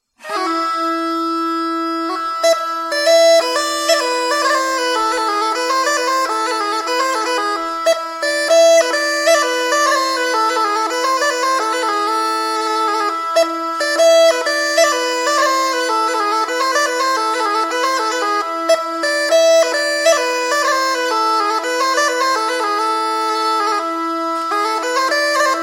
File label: bagpipes, swedish